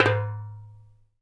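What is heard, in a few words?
djembe, African Perc; Spectrasonics; Supreme Beats; African Contemporary; SB Afro DRMs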